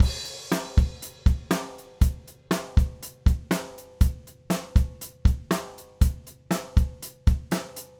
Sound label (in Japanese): ゆったり 8ビート ドラム ドンタドットタン with クローズ ハイハット